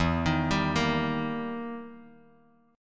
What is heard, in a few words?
E Minor Major 7th Flat 5th, E, down, Bass Standard; arpeggiated; JGuitar